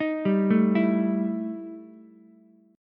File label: E Minor Major 7th Double Flat 5th E Ukulele; arpeggiated; JGuitar